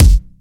rich high end kick drum sample g key, Royality free kickdrum tuned to the G note